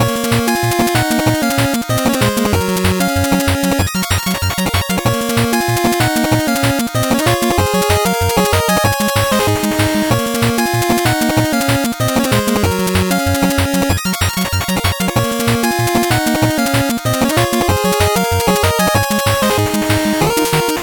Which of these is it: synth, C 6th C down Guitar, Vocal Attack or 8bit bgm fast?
8bit bgm fast